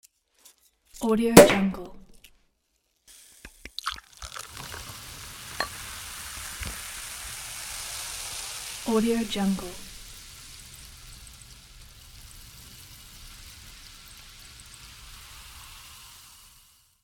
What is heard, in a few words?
pouring champagne